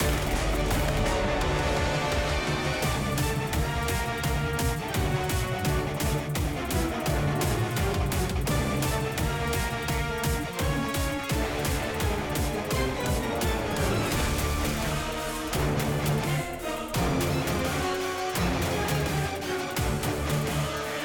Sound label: Contents Menu Unlock Raid; android ios windows gamerip 2024; Solo Leveling Arise